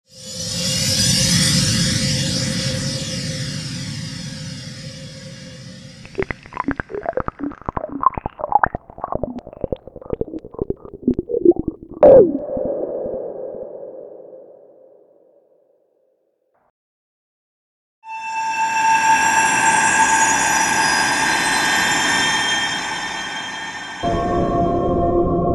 Atmos

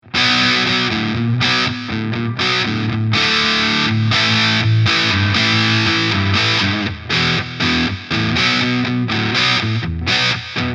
Custom 100 50 gain

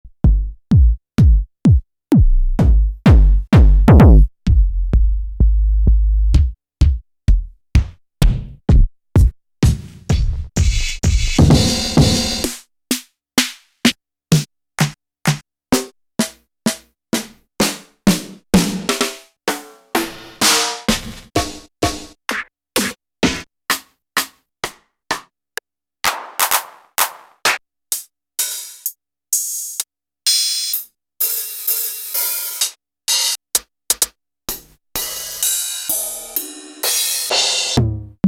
Korg Electribe EM 1 PCM Samples

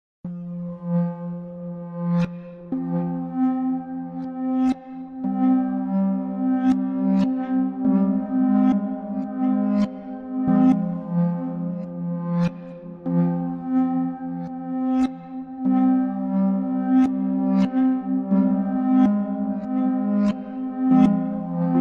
Pigments; A Reserversed Life

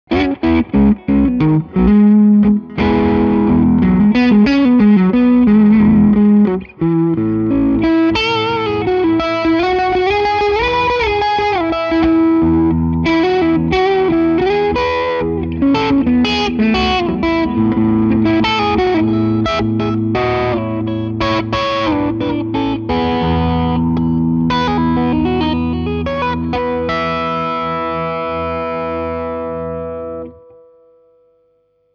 Crunch Lick

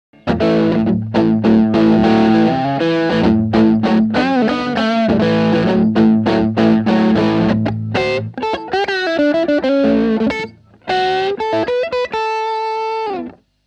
Neck PU Tube Screamer

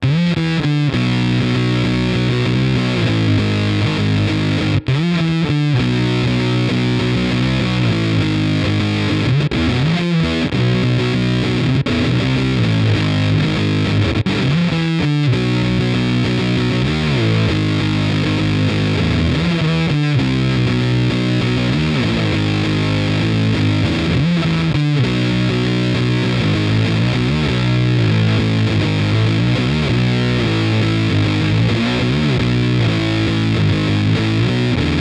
Zvex Fuzz Factory Vertex

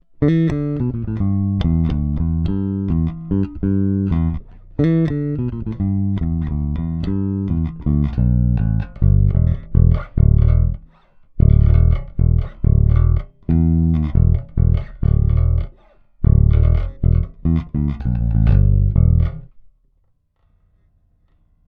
Both passive

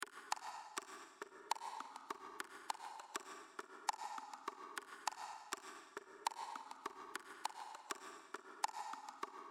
Percloop Reverb

